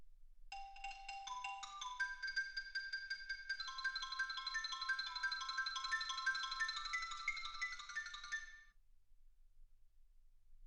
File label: xylophone